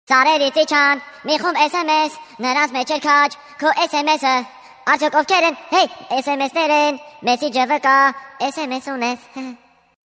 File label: armenian